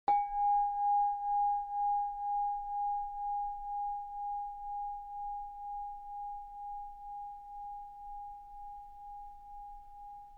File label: crybowl